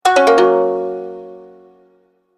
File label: Message Alert, beep